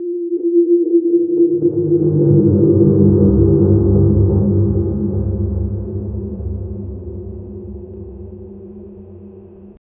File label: film rolling transforming; underground